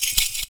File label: FOOT PERC; Univers Sons - Raricussions